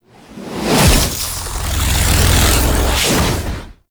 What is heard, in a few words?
Hand Fizzle; Operator; Warframe; The New War; Throne Room